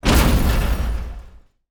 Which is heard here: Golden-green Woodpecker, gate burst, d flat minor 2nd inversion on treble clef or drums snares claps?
gate burst